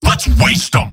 m battlecry; tf2; garrysmod; mvm

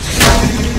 glitch damage; skibidi toilet glitch

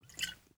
bottle slosh; taunt; Garry's Mod; tf2

